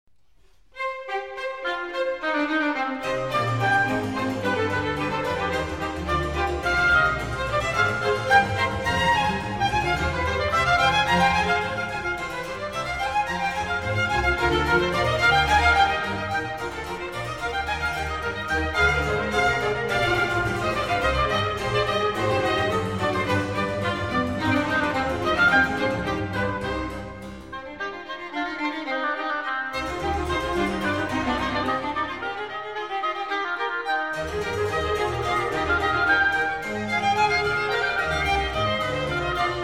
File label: Concerto for 2 harpsichords & strings in C minor; BWV 1060